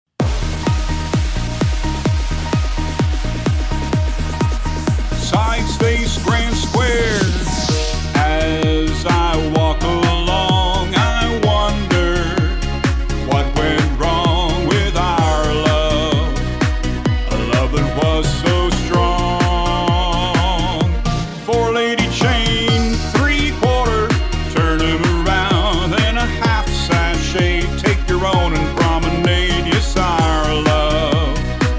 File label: vocal